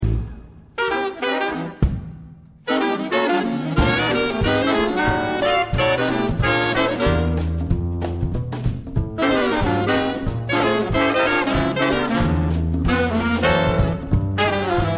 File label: Blues